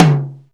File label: DRM, YAMAHA R5+RX; Best Service ProSamples vol.55 - Retro Sampler; AKAI